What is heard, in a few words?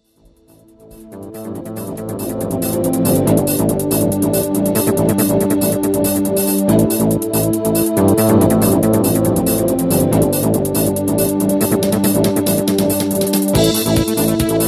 sony ericsson t300 pure ringtone